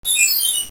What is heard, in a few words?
eerie warp; A Neko Story